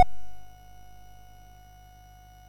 Project Firestart, MOS6581R4

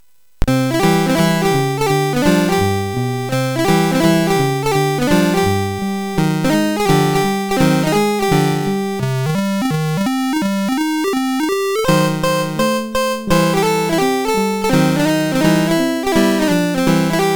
Maple Leaf Rag, MOS6581R4

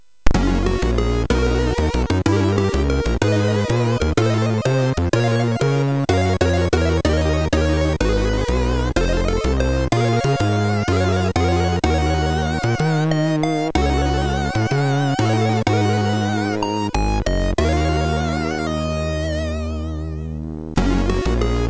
JAZZ, MOS6581R3

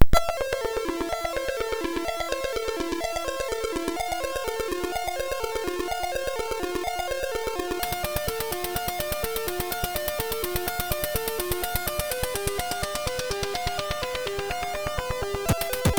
Intro, sid MOS6581R3

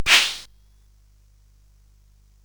SFX; Narco Police

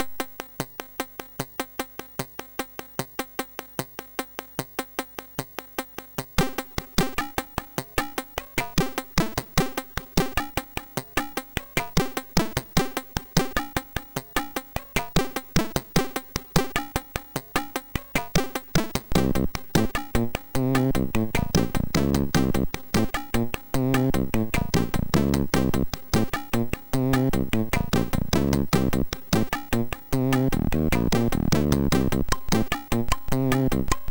D50 tune, MOS6581; MOS6581R2

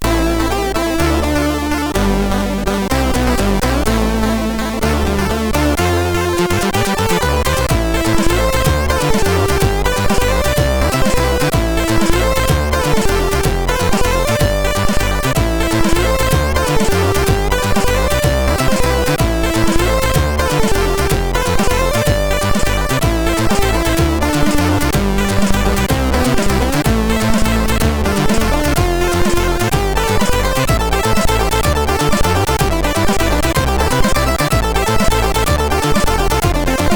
Homework, sid; MOS6581R3; hvsc

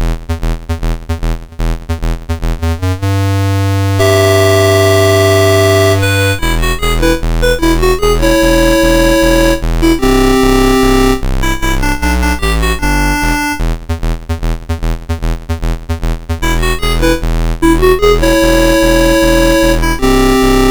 Baracuda, MOS6581R3

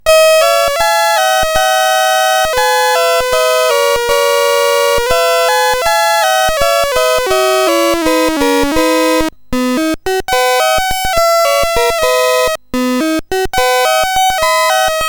BASIC CSG8580R5; sid; Stamitz-Klarinettenkonzert No 3

